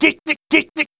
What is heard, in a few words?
sentry buster tick; tf; mvm